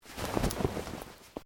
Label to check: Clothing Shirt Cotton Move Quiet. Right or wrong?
Right